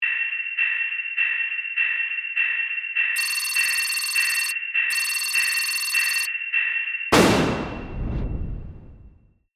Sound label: Composition Count, Production, Element, Composition, Countdown, Time, Running, Out; Blast Wave